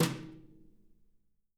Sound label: BRUSHES, Living Drums